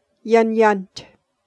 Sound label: laugh